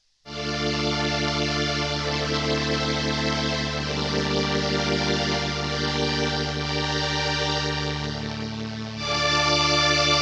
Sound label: Full string ensemble w eq